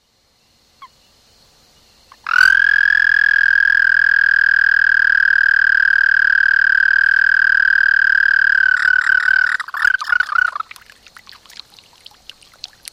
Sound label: frog, Call a melodic trill; Anaxyrus americanus